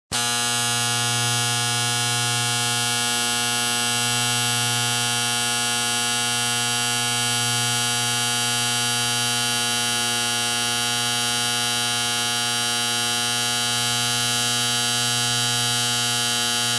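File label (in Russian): включение бритьё выключение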